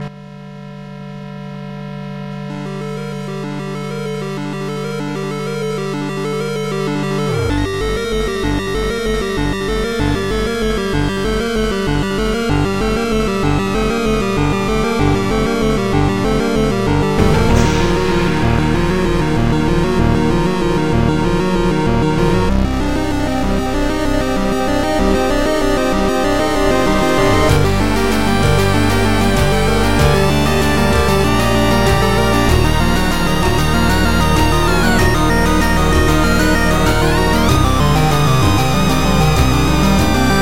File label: 8bit; Walking Dead